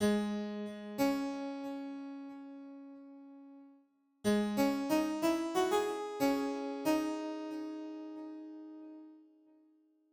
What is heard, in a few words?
piano; boss cruise; player vst; sound selection kits